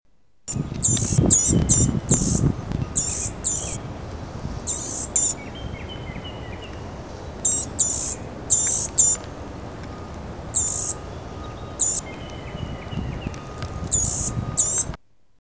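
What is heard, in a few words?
blue-gray gnatcatcher; Birds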